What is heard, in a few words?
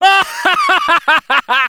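laugh happy, French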